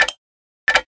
SFX Camera Change; Mario Kart 8

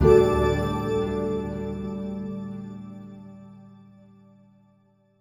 Stingers Sting Gain; gamerip; Hand of Fate 2

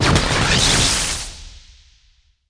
Electric; New The Denpa Men

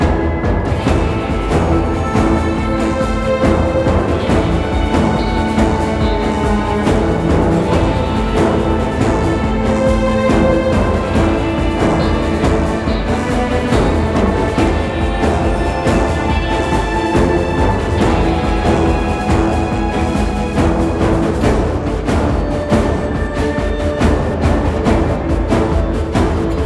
Western Medium Threat; Fortnite

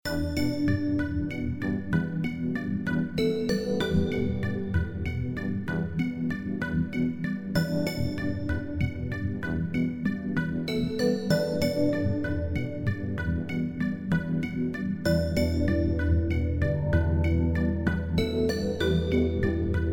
Cave; Dreambreak

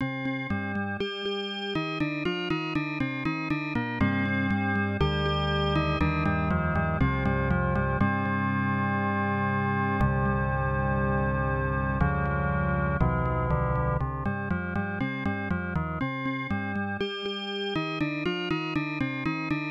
Church; traysia; genesis

